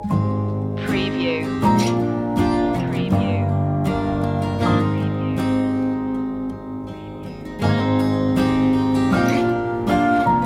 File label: rainbows no vocal